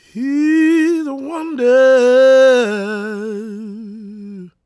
GOSP MALE, Vocal Gospel; Vocal Planet Vol1 Gospel

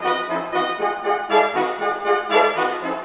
Orchestral Loops; 128 VERTIGO

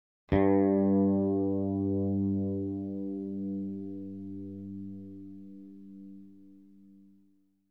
bass g2, Drums & Bass Fusion Funk; KORG - Sound Sampling Collection VOL-5 - Drums & Bass Fusion Funk Effect

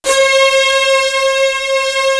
JD STR, STRGS

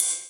Ride; Equipped Music; Loungin House

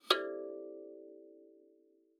KALIMBA D5; Xsample VOL-7

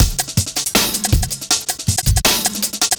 Beat, Drum N Bass

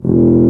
Tuba p F0; Digital Sound Factory Virtuoso

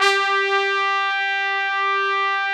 TRUMPS, HORNS

